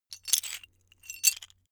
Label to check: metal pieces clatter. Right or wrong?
Right